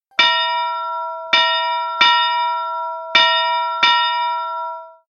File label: New Lumia SMS Tune